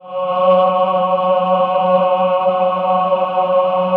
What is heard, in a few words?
CHOIR; Best Service; Robot Computers Akai